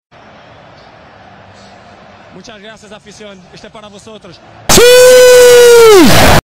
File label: suuuuuuiiiiiii; ronaldo